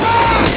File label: car horn